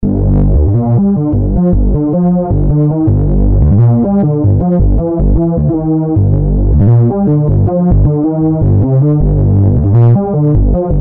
Scum Bass; UA Masterclass; drum and bass

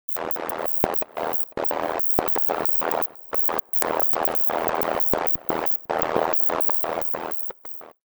Aggresive Vinyl, trip hop; UA Masterclass

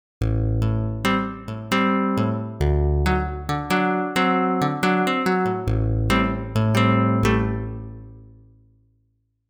Damper Off; SS Masterclass; Masticateur

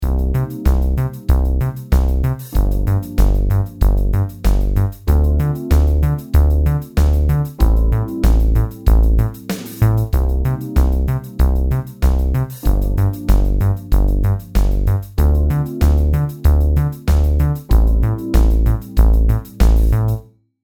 Funk Sub, Funk Disco Basses; UA Theme Packs

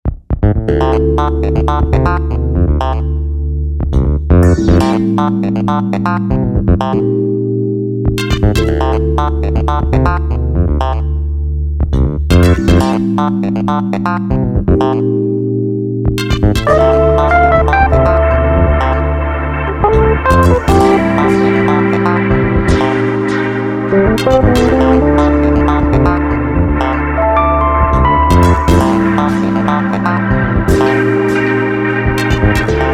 Cyberfunk Smooth; string studio

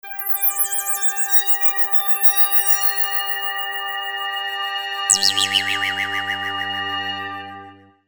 Wiry Synth; UA Masterclass; Trip-Hop

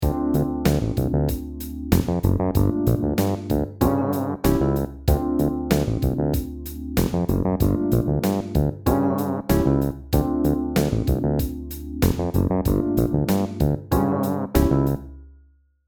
String; UA Theme Packs

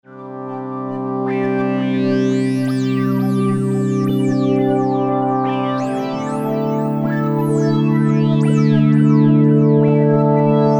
Frying Pad; UA Masterclass; Pads

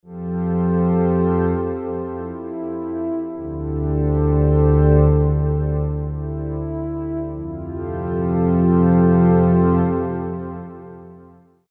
Warm Pad; UA Masterclass; Pads

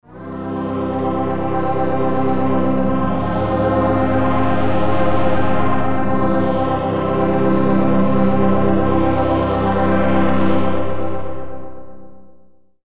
Quire Pad; UA Masterclass; Pads

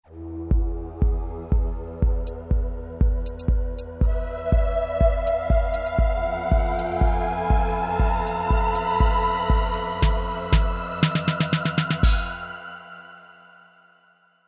Pad Bright Strange Texture; Ultra Analog Session

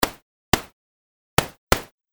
Noise Snare; UA Masterclass; Trip-Hop

